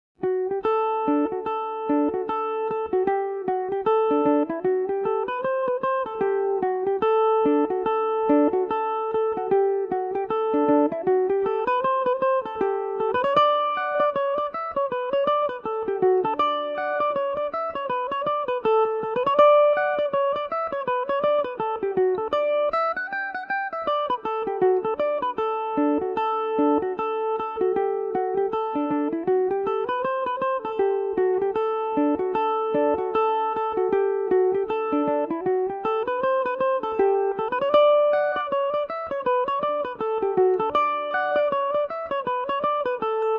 brother gildas reel dmaj, mandolin

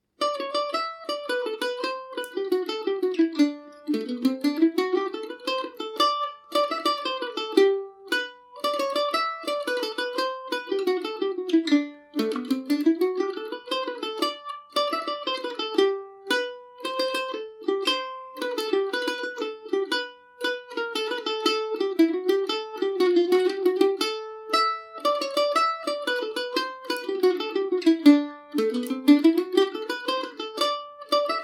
gmaj, mandolin; Paris Swing “Macaferri” mandolin

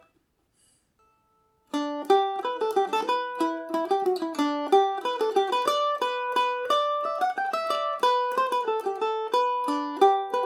farewell to whiskey gmaj, mandolin; Falder; Mandolin Cafe

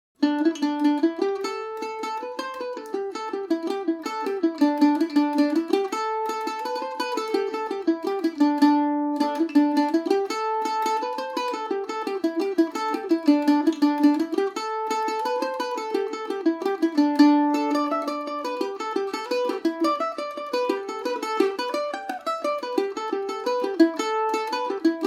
blarney pilgrim dmix, irish mandolin

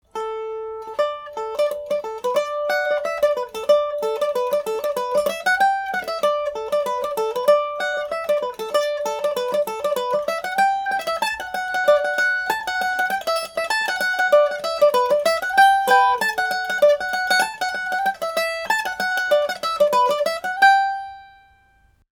md304, Irish mandolin; humours of tulla revisited

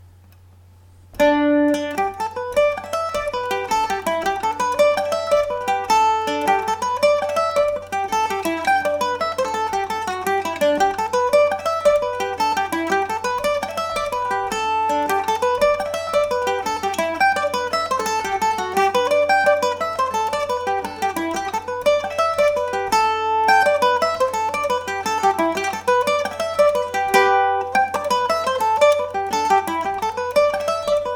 mandolin; hooded man

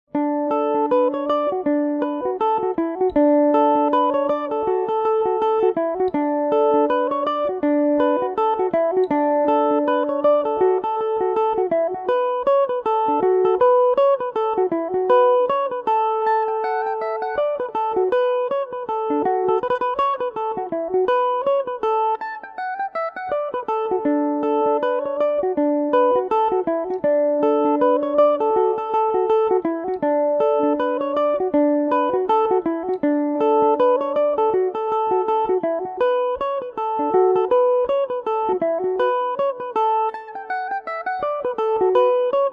one horned buck dmaj, mandolin; The One-Horned Buck; Revelation Jaguar electric mandolin

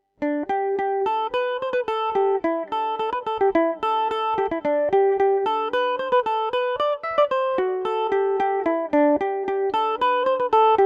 jack ryans polka gmaj, mandolin

